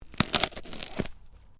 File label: Ice: creak of ice under pressure; Snow; Weather; Snow Sound Effects; Stuart Duffield